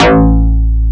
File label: XFM BS, FM Syn Bass, Synth Bass